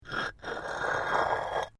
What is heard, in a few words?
PAVING STONE MOVE, dragging; Material components for constructing more complex sounds; Dragging (Sound Effects:Objects)